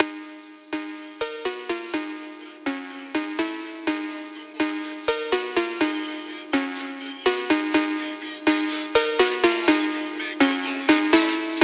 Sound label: phonk remix